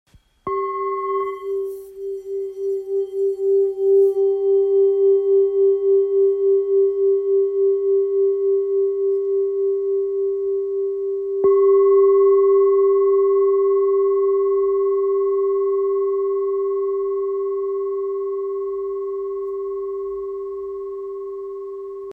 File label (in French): Bol ancien dol 295g